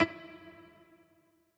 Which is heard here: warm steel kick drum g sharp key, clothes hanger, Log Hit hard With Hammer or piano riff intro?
piano riff intro